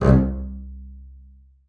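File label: marcato C2 right, bass spiccato; Xsample VOL-5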